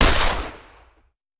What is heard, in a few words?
Hand Gun; Sound Ideas; Guns